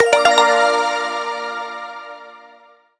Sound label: multi line win; Sizzling 777; wazdan